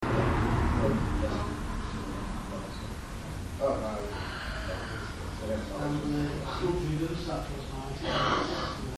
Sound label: breath